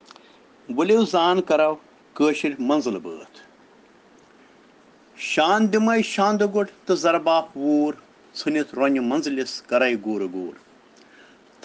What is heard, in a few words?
Children Rhyms in Kashmiri